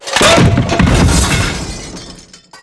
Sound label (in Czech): stůl; Mafie 1